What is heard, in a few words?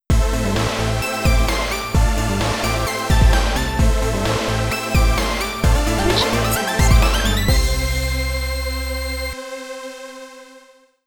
Synthwave Sub